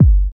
90s boom bass drum one shot g key, Royality free kick drum tuned to the G note